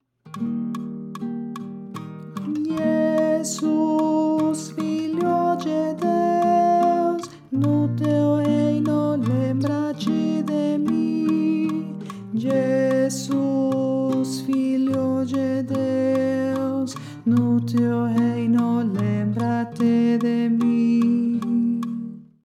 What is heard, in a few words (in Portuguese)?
Contralto